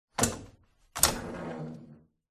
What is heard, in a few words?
household ironing board built in fold